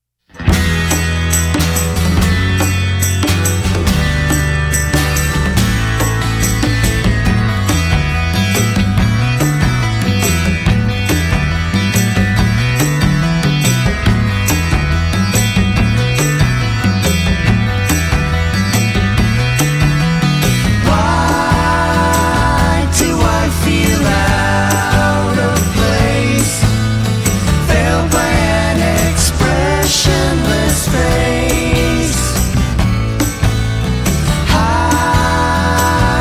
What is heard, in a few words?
Acoustic Version